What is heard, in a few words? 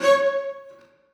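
celli staccato c2; Sonatina Symphonic Orchestra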